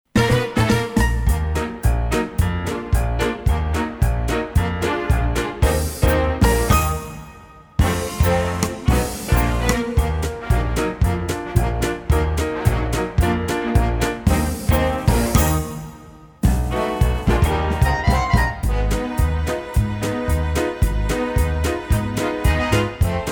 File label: Backing Track; Flash Mob